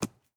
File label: arrow dirt, impact